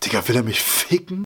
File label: hurt; spackenmobs; apored